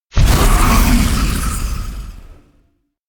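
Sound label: electronic dragon roar; anemoi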